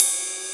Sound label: ride ping